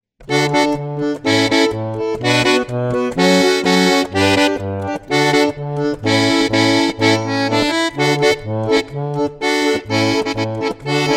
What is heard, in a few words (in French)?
bourree playback 125 bpm; boeuf trad